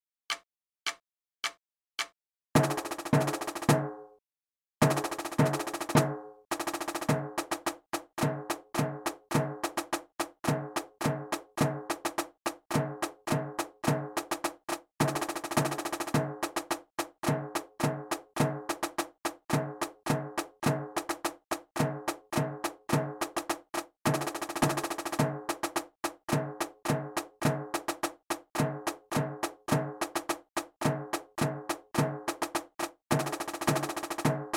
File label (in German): Perkussion, Spielmannszug; Edinburgh Tattoo; Auld lang Syne